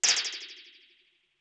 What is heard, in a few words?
wire slap; gonner linux macos ps4 switch windows xbox one gamerip 2016